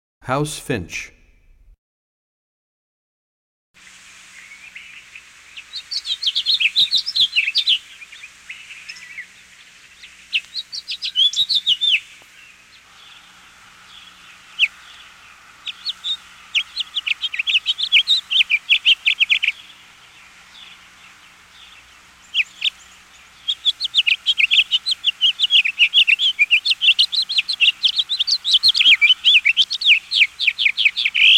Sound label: House Finch; Animals; Birds; 101BirdSongs